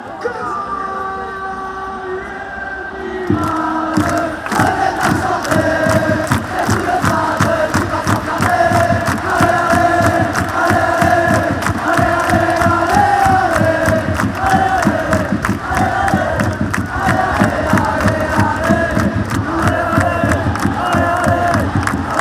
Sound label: alay alay alay, chants